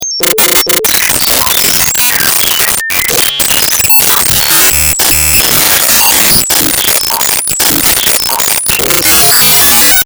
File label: random Sine